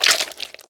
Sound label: hit human organs; Blind Drive